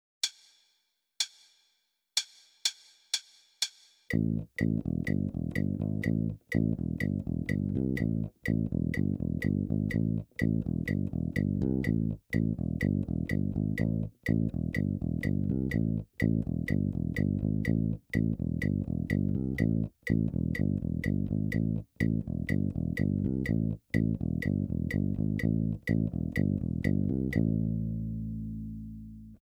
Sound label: Rock